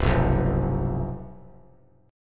C Major 7th Suspended 4th, down; strummed

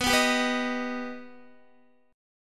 B Augmented B down Guitar; strummed

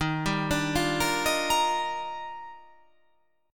D sharp Major 9th

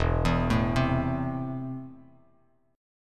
E Suspended 4th Sharp 5th, Bass Standard; arpeggiated; JGuitar

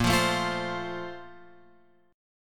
A sharp Major 7th Suspended 2nd; A#M7sus2 Chord {6 8 7 X 6 8} strummed

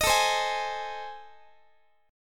A Minor Major 7th Flat 5th, Guitar; AmM7b5; strummed; x,x,18,19,16,19

